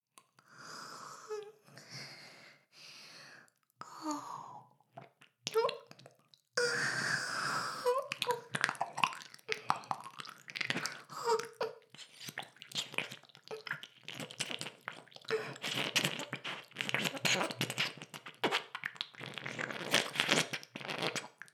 耳舐め音声(LR逆ver); ASMR; ダメなメイド

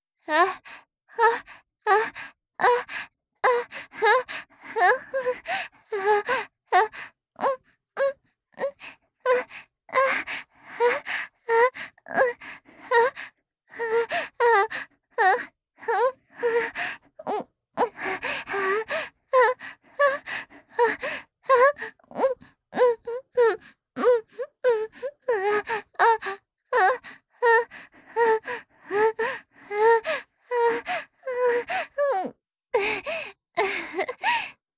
相手の腰にまたがり腰を振る; 実用ボイス抜粋集

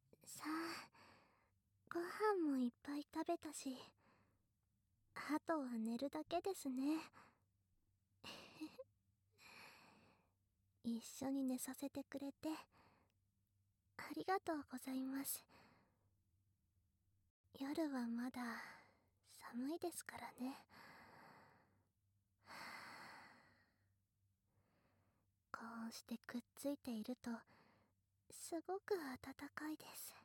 効果音なし 耳舐め; ASMR; 乳首責め、クンニポルチオ責め、ポルチオ連続絶頂、大量射精、潮吹き

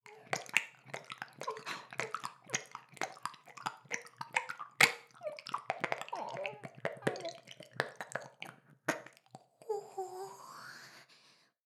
耳舐め音声, ASMR; ダメなメイド

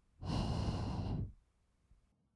へ, ブレス, 吐く音, kawaii voice; ASMR; 1200 kawaii voice!!

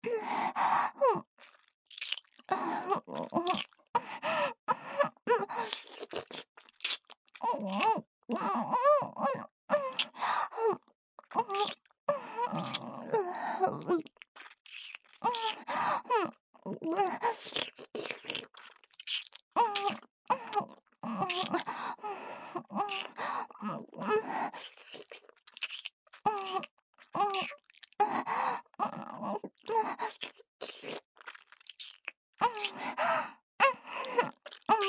ディープキス

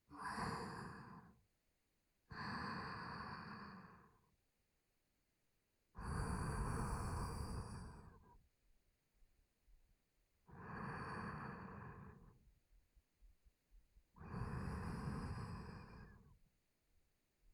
寝息（左）鼻息

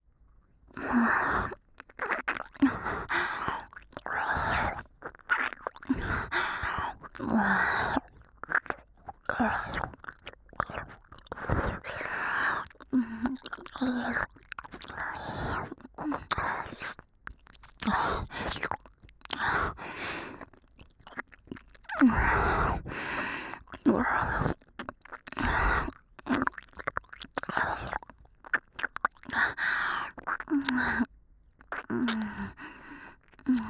耳舐め（ゆっくり）

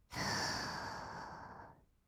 吸う音, ブレス; ASMR; 1200 kawaii voice!!

